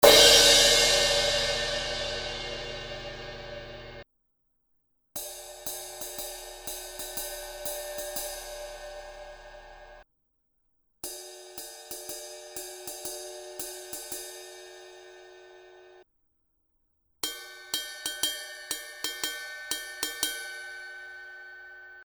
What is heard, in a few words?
19 inch k custom hybrid crash crash outer ride inner ride bell; zildjian